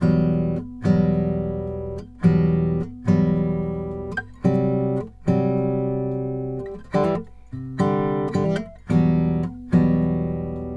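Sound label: Country Guitar, Verse 1 Alt; Digidesign; Pro Tools Essential Loops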